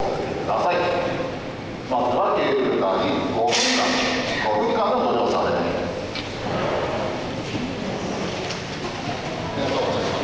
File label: kurobe dam; shinano omachi onsen; the alpine route to kanazawa